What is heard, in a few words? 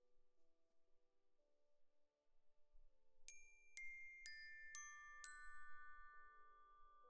Glock Close